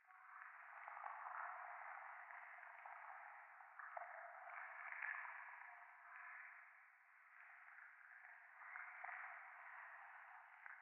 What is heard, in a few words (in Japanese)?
廃墟 右耳密着吐息 触手